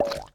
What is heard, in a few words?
Brain Bounce